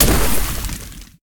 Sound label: rain impact; smite; vulcan